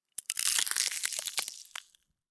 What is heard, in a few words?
freeze; tekton